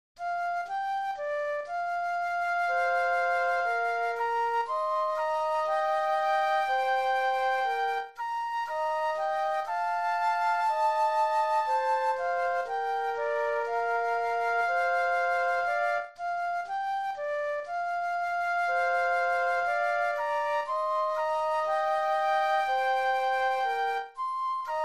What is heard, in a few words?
Flute Duet